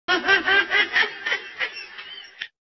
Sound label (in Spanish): Risas mínimalistas en cámara lenta